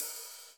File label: OHH, HI-HATS; Spectrasonics; Liquid Grooves; LG HI-HATS